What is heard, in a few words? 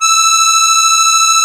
musette E5, accordion